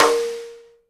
snare RIM RING, Live Snares

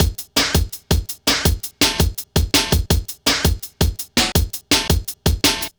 Klanga, drum loops 165.5; Zero-G Jungle Warfare 1, 2 & 3; 90 s Sample CDs

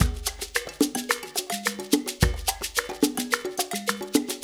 loop, Percussion Slam; InVision Interactive

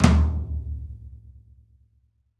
Pop Flam Tom, Roland BK-5

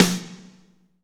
SNR, Snares, Roland; Northstar - Drumscapes Roland

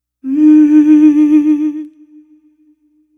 Celtic Voice; Sonic Foundry (Sony Creative Software); Continental Drift Aftershocks; Vocals

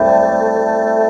Pad

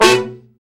BRA SECT; BRA SECTIONS

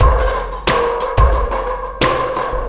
Sky Lab Beat, Processed Drumkits

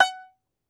Tenor Banjo, Plucked Strings

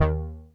Deep Bass d#2, Korg EX-800

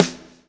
LASH, Backbeat

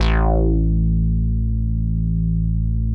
MINI TUJU A, MINIMOOG; Big Fish Audio - First Call Vintage Keyboards

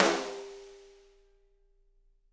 SD, Drums; Bob Clearmountain Drums I

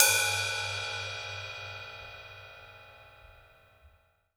stick, hi-hats; Sampleheads; New York City Drumworks VOL-1

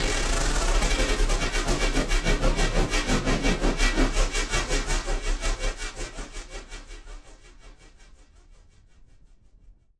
Battered Synth; malfunctioning synth samples

